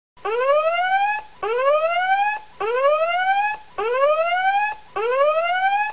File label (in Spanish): alarma efecto de sonido